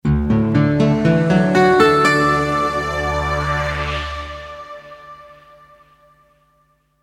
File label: Logo, Audio Motif To Accompany Visual Logo Or Screen; Musical; Miscellaneous Musical Sound Effects; Miscellaneous Musical (Sound Effects:Musical); Stuart Duffield